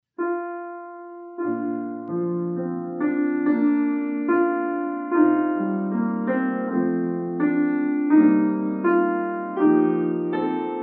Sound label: Piano; Larice Music; America the Beautiful